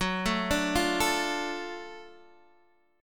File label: Gb Minor Major 7th Sharp 5th Gb, down, Guitar, Open D